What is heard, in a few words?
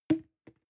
plastic can small fall bounce soft hollow